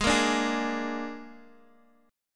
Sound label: Gsharp Suspended 2nd Flat 5th, Guitar, Half Step Down